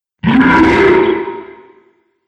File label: Demon Of Distortion, Monster Sound Effects